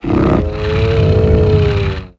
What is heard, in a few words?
die; tusken King; Star Wars Obi Wan; XBOX